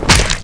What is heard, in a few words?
rifle hit flesh